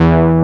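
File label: SYBA E2, Synbass; STACK BASS; AKAI S6000 CD-ROM - Volume 1; BassGuitar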